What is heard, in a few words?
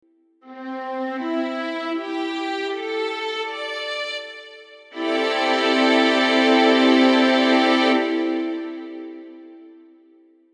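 chord strings 69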